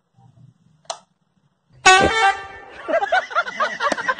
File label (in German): trumpeten